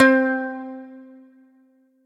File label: Cigar Box, Plucked Items; Instruments; Ableton; Factory Packs; Lost and Found